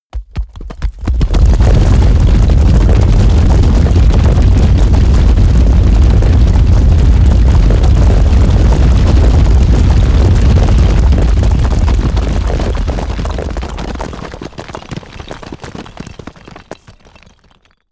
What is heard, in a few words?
stone drop down large long